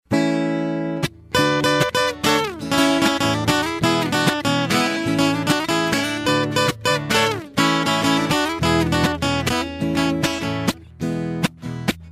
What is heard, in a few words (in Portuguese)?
instrumental